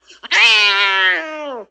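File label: Cat Domestic Meow Angry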